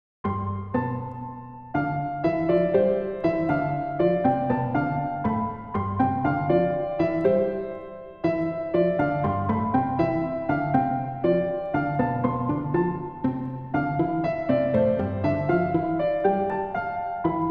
Silly Tune

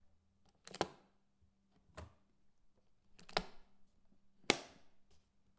Suitcase Latches

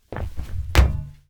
Jump Metal

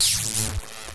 Freq Blip

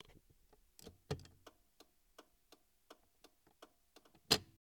Indicator

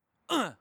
Male Grunt "Uh"

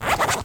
Zipper Squeal

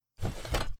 Draw Heavy Weapon

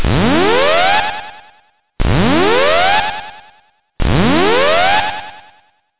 Klaxon

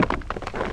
Chair

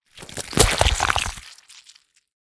Intense Gore Sound Opening Chest Cavity

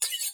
Game Sword Attack

